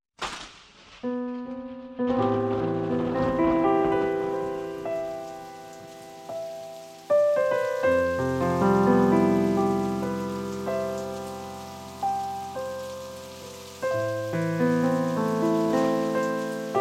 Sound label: Trust (piano)